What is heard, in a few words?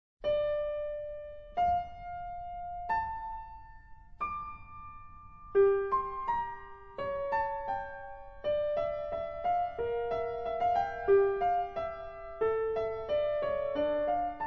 Domenico Scarlatti Piano Sonata in D Minor